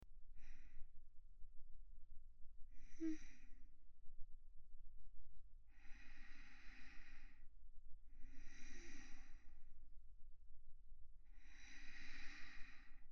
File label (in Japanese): 睡眠導入 すやすや ループ音声; ASMR